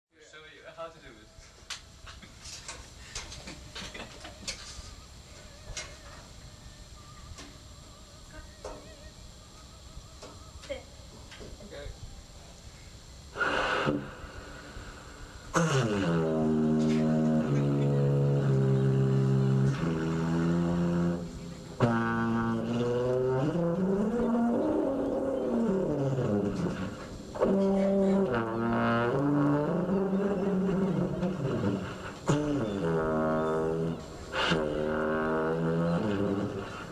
For Girl, Boy, Dog, Tuba, Piano, Tape Recorder & Electronics